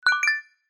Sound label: beautiful message notification tone ringtone